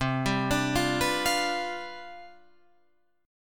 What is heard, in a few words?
C Major 7th Suspended 2nd Suspended 4th, Guitar Drop D, chord